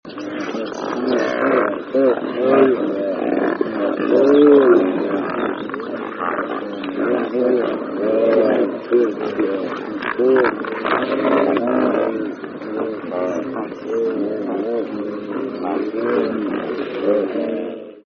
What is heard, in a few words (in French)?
pelican blanc